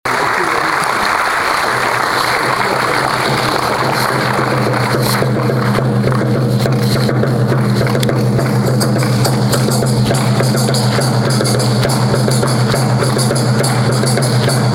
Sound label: Instrumental; Kazan 21.04.2007